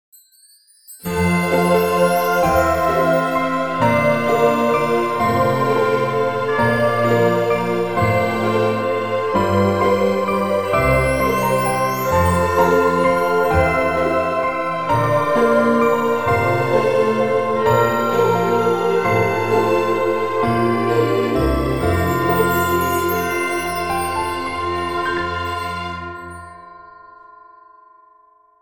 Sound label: Childish Fantagy